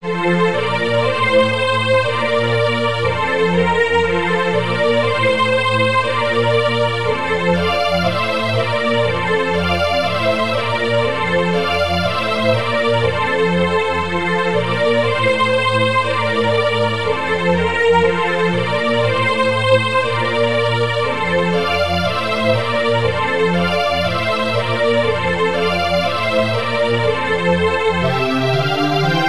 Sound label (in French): carillon; boismortier joseph bodin de